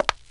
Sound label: CONCRETE, FOOTSTEPS; 1000fx